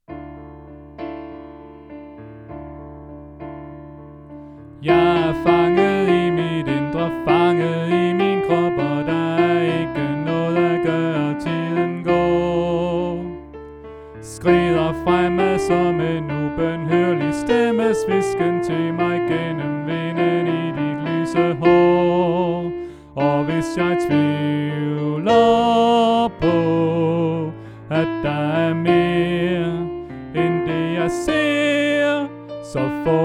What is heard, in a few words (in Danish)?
Sopran; oevefiler